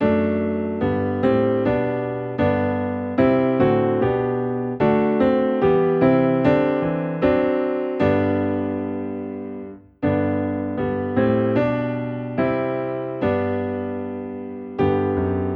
Piano Low in G; A Charge to Keep I Have

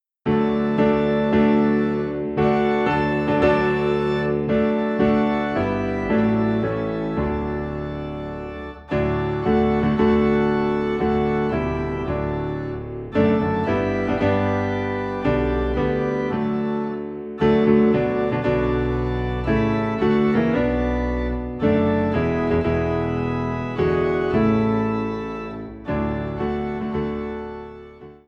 Piano and Organ Low in D; Victory In Jesus

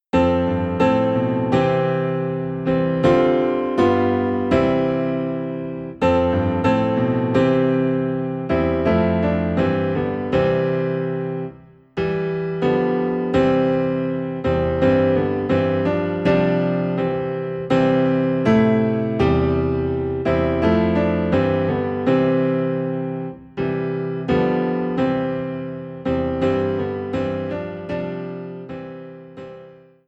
Piano Low in C; Once in Royal Davids City; christmas

